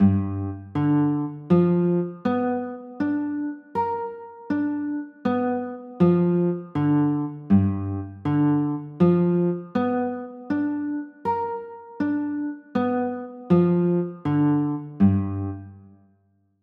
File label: g 7 sharp 9; chords